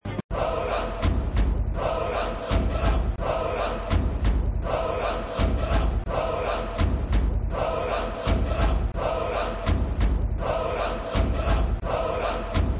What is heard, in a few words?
Music Steal the stones music (looping), flipper